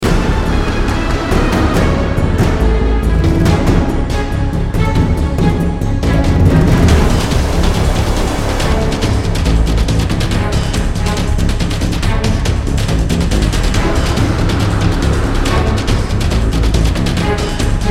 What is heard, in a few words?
dark loop; gamerip